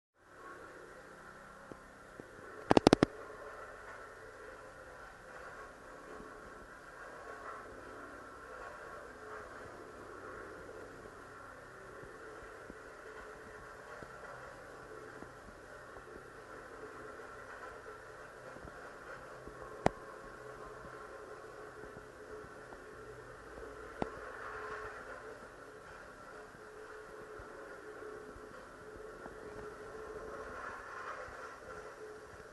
gigantic robot dinosaur

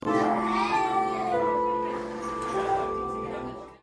piano

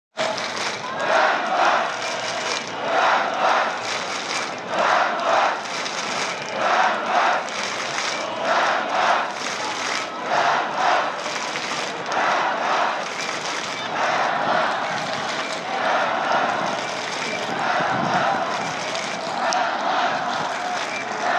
pro russian victory day march

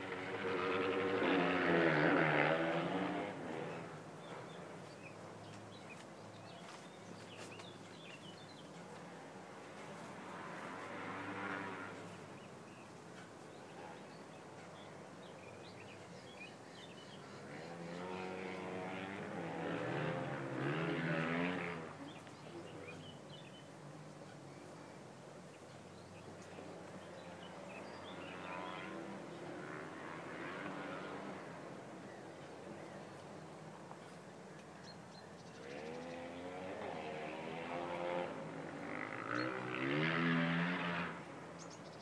Rye Meads soundscape

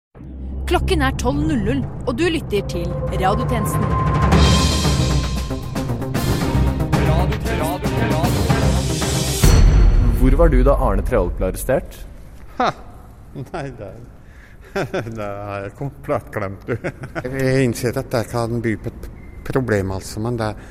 radiotjenesten jingle

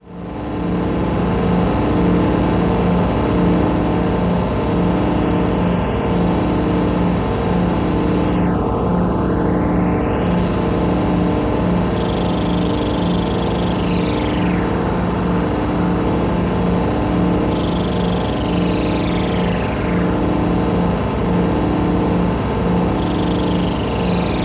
Venice water taxi processed version

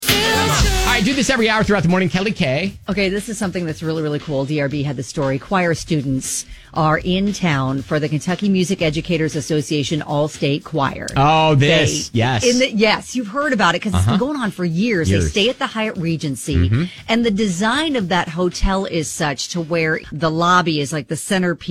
kentucky choirs sing national anthem

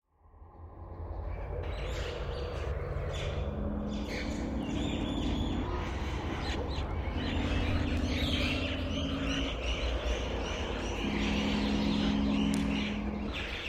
sounds from another place kazakhstan

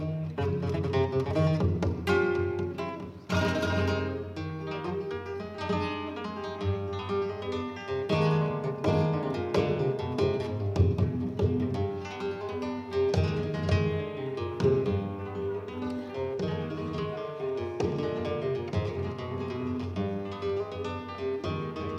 flamenco

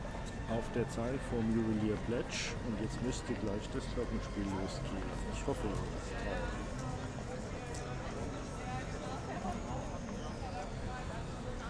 glockenspiel zeil frankfurt m

